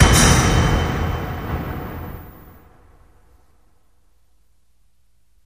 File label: Metal Impact Lrg